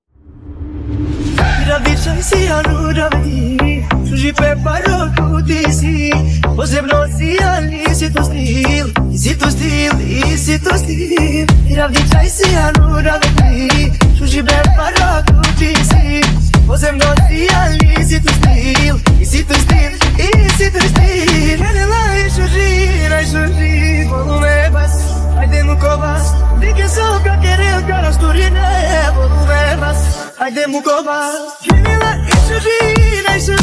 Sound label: bass bass